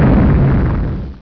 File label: EXPLODE; TAIL